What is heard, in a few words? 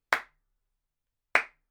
Claps Dry